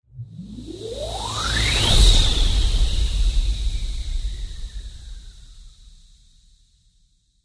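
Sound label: sounds of time machine or teleport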